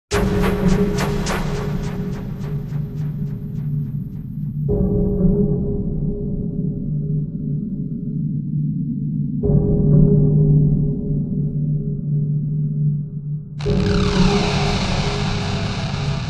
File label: Game Over Lose; Unreal II The Awakening; XBOX; XMP